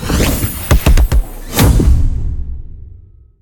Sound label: random gorilla; silverback